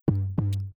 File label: loop beat bass 808 Hard glued